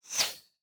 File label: Arrow Fly; Fantasy Sounds Bundle; Medieval Combat Sounds